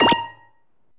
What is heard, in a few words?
SE LEVEL MASTER; maimai